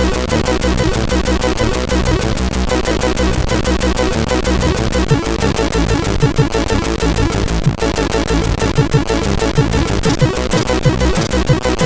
Levelmusik, CSG8580R5; hvsc

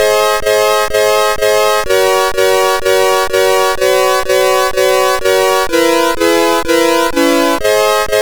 Akkorde, CSG8580R5; sid

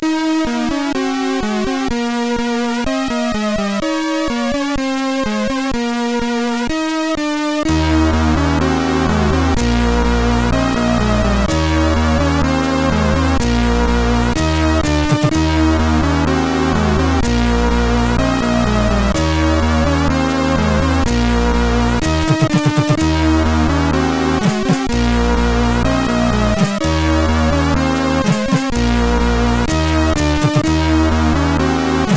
Orgel, CSG8580R5; sid

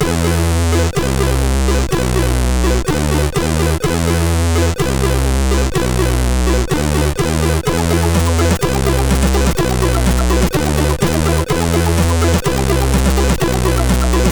Cool Akkorde, CSG8580; HVSC

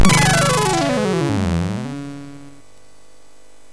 Knallbummeffekt, MOS6581R3

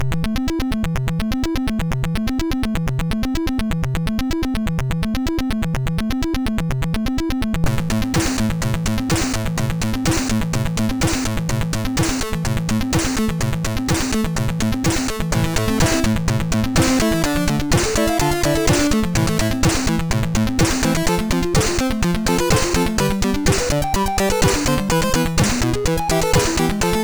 Kurze Melodie, MOS6581R3

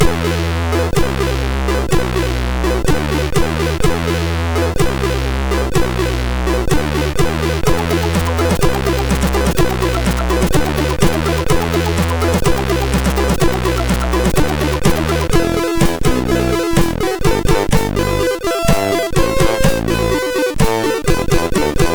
Cool Akkorde, MOS6581; HVSC

